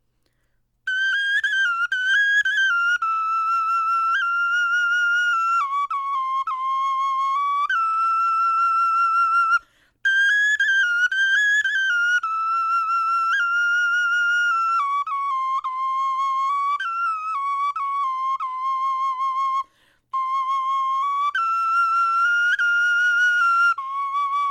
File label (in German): melodie